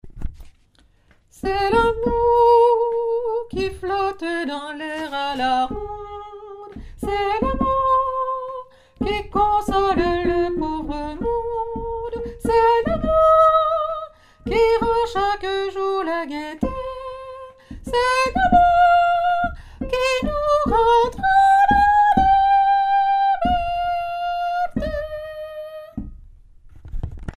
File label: tenor